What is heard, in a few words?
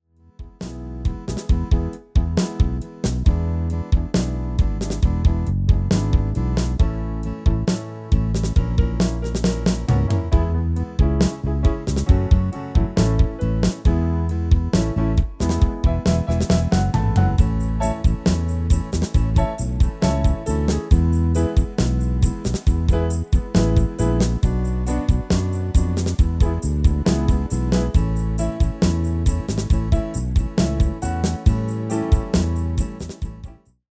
MIDI